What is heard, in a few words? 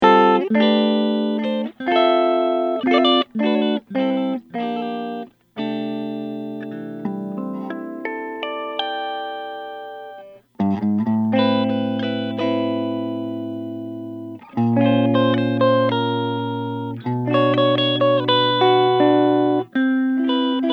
gibson 59 les paul reissue flame